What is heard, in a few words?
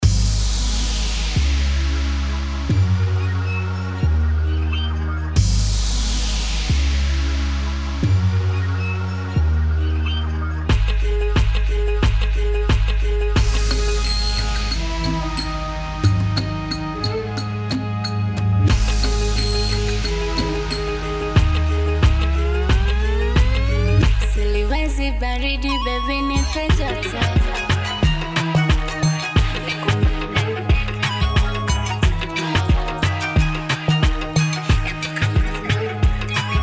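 Middle East Promo Bed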